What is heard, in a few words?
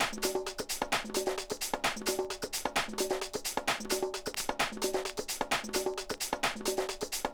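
arch drum tribals F sharp minor 131; hardgroove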